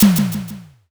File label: pistol